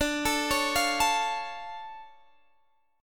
D Minor Major 7th D Guitar Open G

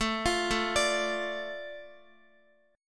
A Suspended 4th Sharp 5th, Guitar; Asus4#5; arpeggiated

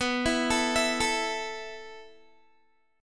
B 7th Suspended 4th B, Guitar

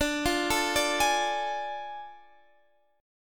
A Major 7th Suspended 4th Sharp 5th

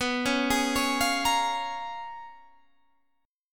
Db 13th B, guitar

